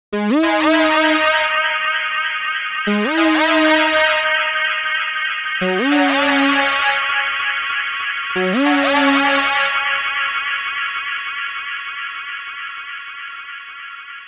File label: Deep Space, Atmospheres; AMG - Prototype Drum & Bass